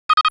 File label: Wrap Back Louder; Blind Yoda Sound Scheme; star trek sound pac